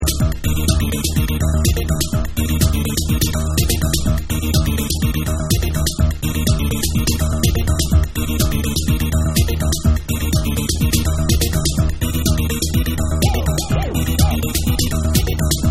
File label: Housemix